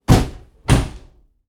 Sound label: van back door close; transportation